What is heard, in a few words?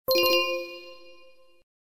Double Tone; Tecno Spark Go 2022